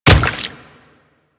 Water Step, Footsteps; Warframe; Grineer Royal Guard

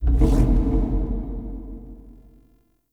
Shard Menu Open Main; DE; Warframe; Helminth Shards

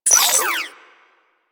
Digital Glitch, Ambience; Warframe; Corpus Railjack

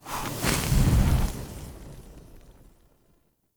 Blaze Armour Loop End; Powers; Fenn

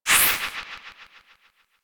Tutorial Light Beam, UI; Lunaro; Warframe

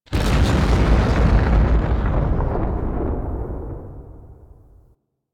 Destruction Heavy Hits; Ambience; Warframe; Corpus Railjack

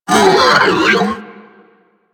Sentient Pain, Enemies; Warframe; DE; Sentients; Ortholyst

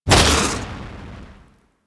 Flame Thrower Fire 3D C; Sargas Ruk Flame Thrower

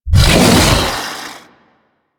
Wolf Charge A; Warframe; Grineer

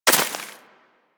Bullet Impact Debris Snow

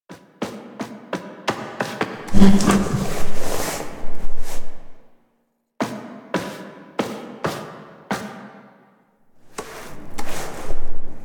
Destroyed Class Barricade Foley; Cinematics; Warframe; The New War; Zariman

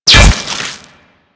Bolt Impact Stone; Warframe; Weapons; Cross Bolt

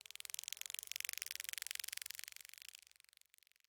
Forest Open Glade Grass Hopper Fly By, Day; Ambience

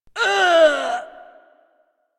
Injured Grunt

